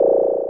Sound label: error, technika